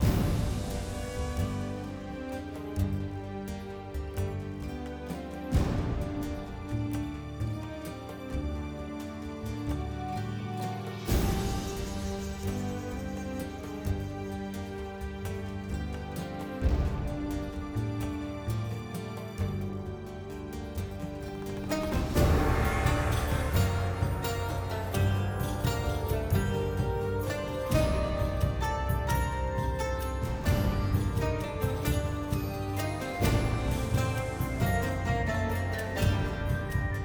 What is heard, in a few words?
dungeon; Fate The Cursed King